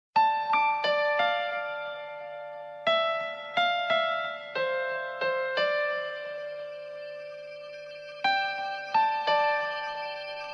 Soft And Smooth

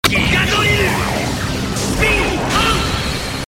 Drill Spin On

